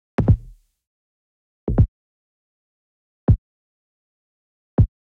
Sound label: music main game kick; reef raider